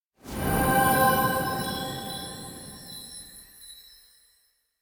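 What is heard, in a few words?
electronic heaven like place; anemoi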